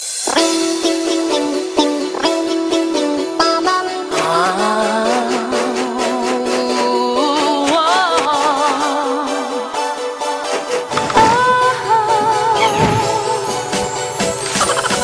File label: ding ding ding